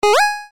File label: button